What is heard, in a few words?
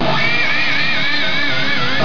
vhs top; Sammy Sound Files; windows 95 applications